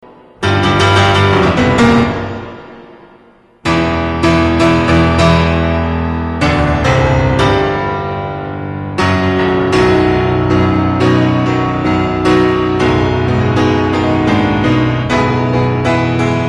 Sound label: Liszt Piano Sonata In B